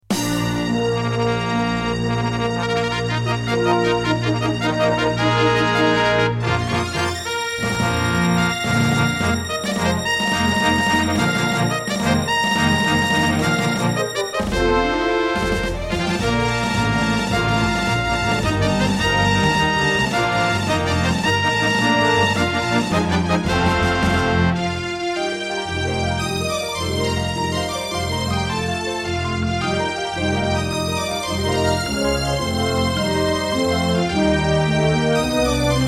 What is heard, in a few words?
Intro, Yamaha DB50XG GS MODE; Dark Forces